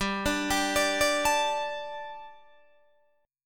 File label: G Suspended 2nd G, down, Guitar, Open D